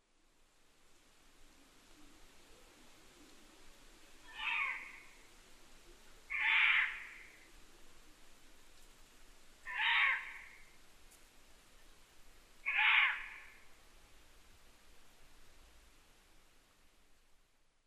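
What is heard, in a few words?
Ural owl; fukurou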